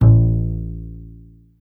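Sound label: string contrabass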